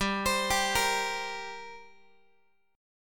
G Minor Double Flat 5th G, Guitar, 4A, 4E, 5A, 5D, 5G sharp, 6C sharp; arpeggiated